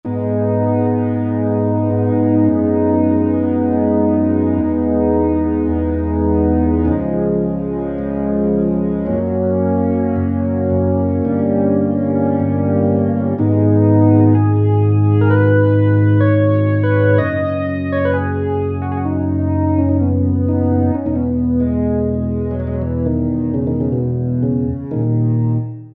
3 Bars - Slow Leslie - with Light Distortion, Rock Keyboards; Greytsounds Philip Wolfe's Rock Keyboards